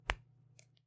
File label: Coin Flip Ping